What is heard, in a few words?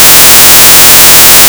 golay B